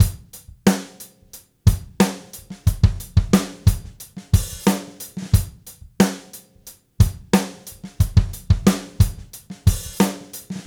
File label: Gretsch Groove 90, 1967 Gretsch Kit, 90 BPM, Drums